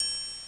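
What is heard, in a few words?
open triangle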